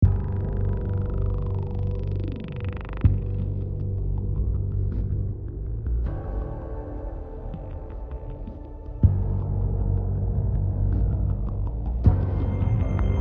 Linear Tense; tales from the borderlands gamerip 2015

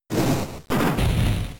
Fire Punch; Pokemon; Gen 3; attack moves; RSE

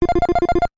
Paused; Balloon Fight; animal crossing full gamerip

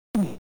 ooouuufffhh

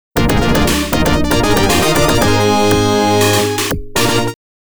LAST ROUND CLEAR; Capcom Music Generation Area 88 Original Soundtrack

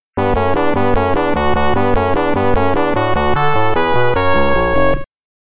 Round Clear, FM

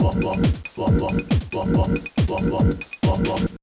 Microgame - Snare

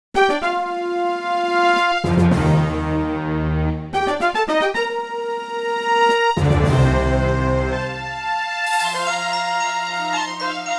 Boss Clear Fanfare; Legend of Zelda Takt of Wind Original Sound Tracks

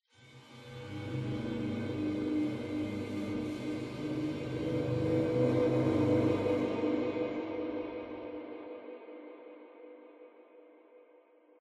Cymbal

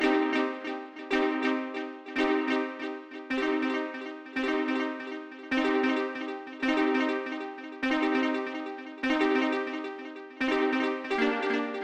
Frog A Proximity; Proteus

